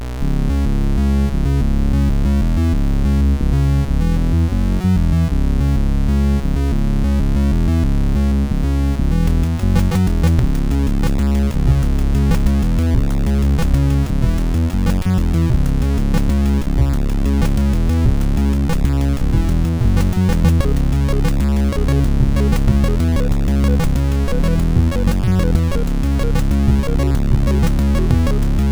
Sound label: half funk, sid MOS6581R4